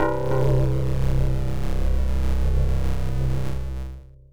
Defeat; lam mal pc 98 gamerip 1992

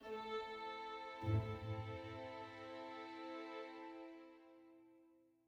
adventure soft end

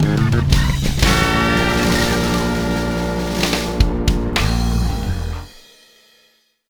Defeat; blitz brigade; android

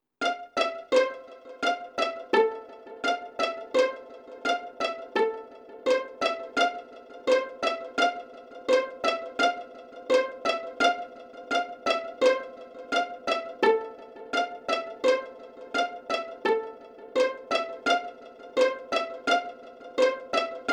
Loop Nofade Nobase; King Lucas

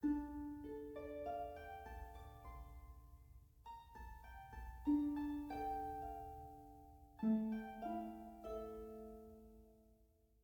harp

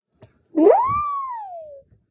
Reaction eye roll

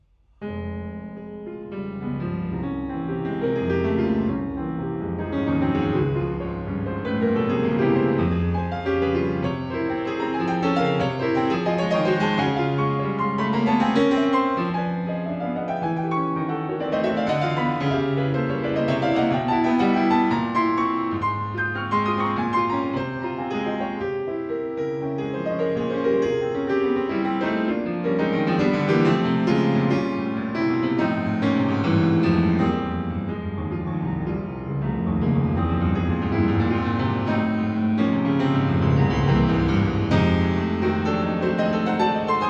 Ballad; Ballad2 Geist; Pianoforte

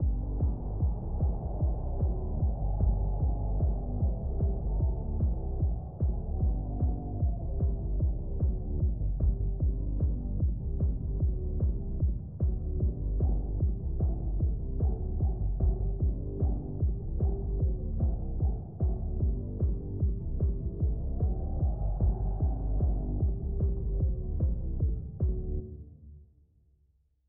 Ambience Stairway 64beats 150bpm

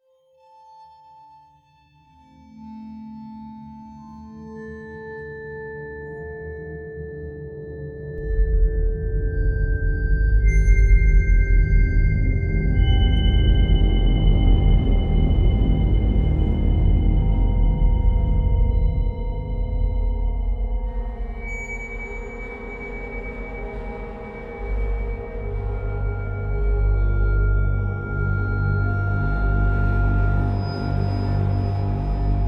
linear Eerie

